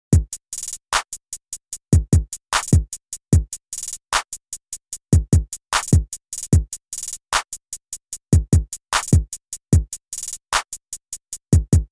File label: drum hiphop trap